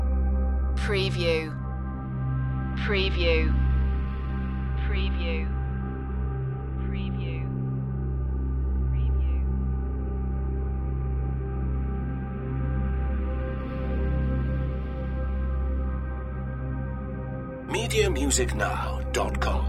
over distant shores loop

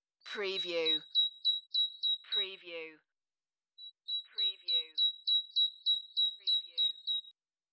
Isolated bird call in Moel Famau Forest; beanstalk audio

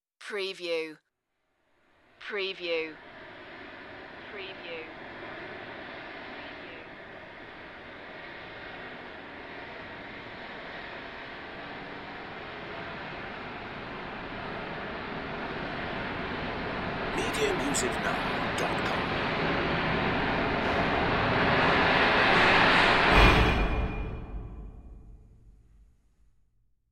eerie tension

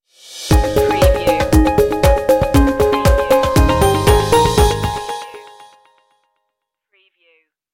inspirational stinger

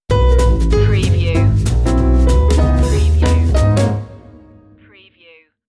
tip tap stinger

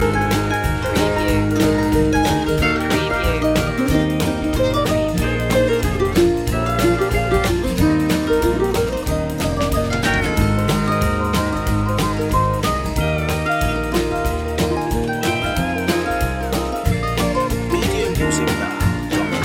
country loop

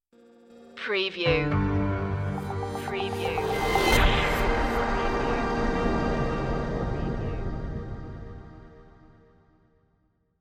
descant sky stinger

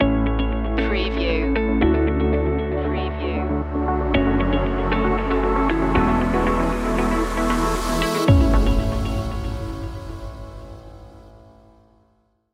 positive flow stinger

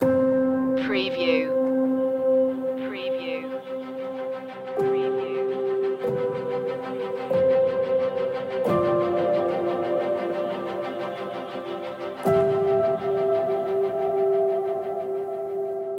a new movement loop

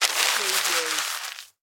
Paper Towel Crumple

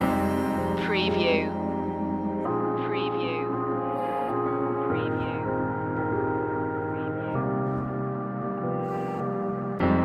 dark loop

